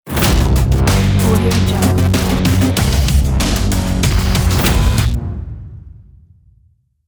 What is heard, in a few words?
action trailer logo